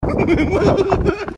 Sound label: hehhhmh; krusty